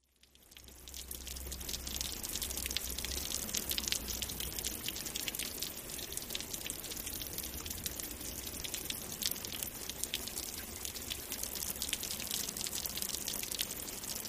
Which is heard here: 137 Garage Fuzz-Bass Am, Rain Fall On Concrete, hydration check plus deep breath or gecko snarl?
Rain Fall On Concrete